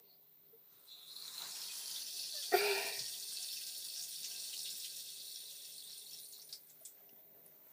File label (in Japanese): おしっこ トイレ モノラル